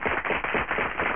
applause; Amiga Soundtracker Sample Packs

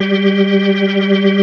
G3, Keyboards Old School; USB Soundscan vol.09; AKAI; SMALL B3

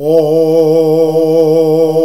OOOOH A#, male choir; Best Service; Hyper Dance

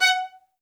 MED ATT F# 4, soft violins; Q Up Arts; Denny Jaeger Master Violin Library

